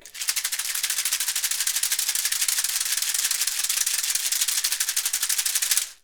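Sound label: rattle, percussion; ILIO; Synclavier Percussion 2; World & Orchestral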